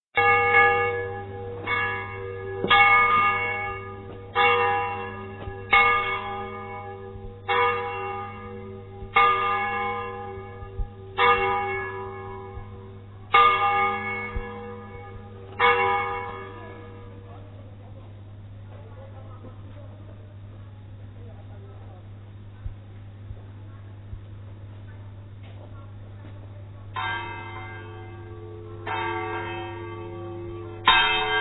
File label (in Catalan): campana